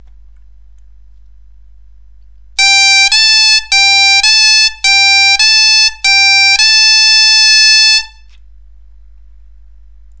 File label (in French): Gamme sol la naturel, bombarde